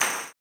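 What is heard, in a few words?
tambourine, 160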